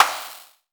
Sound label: Tempest Analog Snare